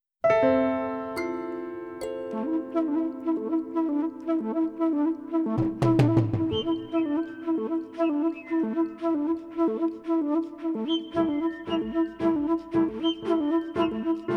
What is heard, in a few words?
Piano, Kalimba, Flute, Tubes